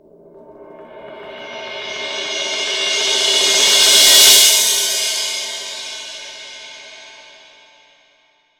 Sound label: OLD MLT